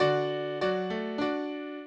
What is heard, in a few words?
minuet, mozart